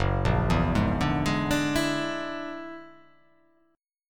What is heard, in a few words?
F sharp Augmented 9th; 2,1,2,1,2,3,4,1